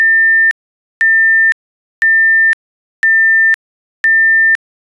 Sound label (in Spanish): tono